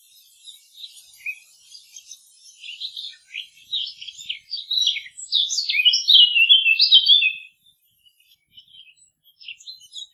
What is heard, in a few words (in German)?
Vogelstimmen